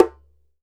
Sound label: DJEMBE, African Perc